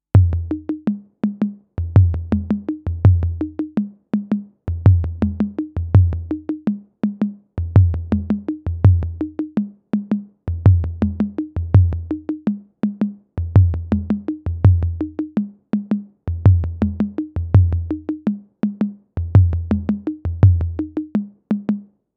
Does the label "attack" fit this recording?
No